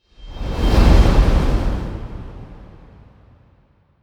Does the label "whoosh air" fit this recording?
Yes